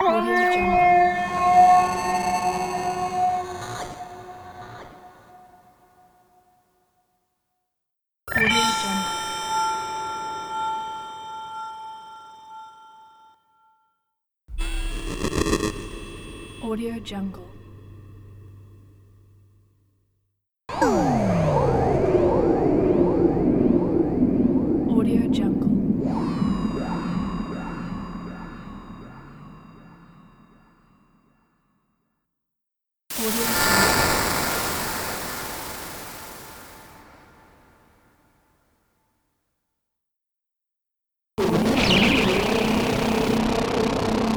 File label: dark scifi transition pack